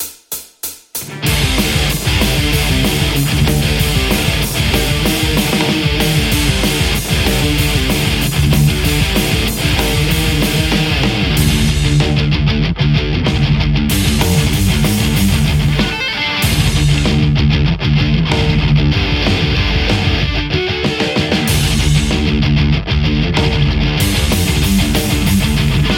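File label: metal Telecaster; bteaudio juicy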